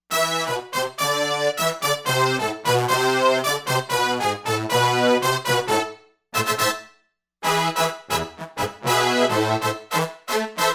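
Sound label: Brass Hi Sect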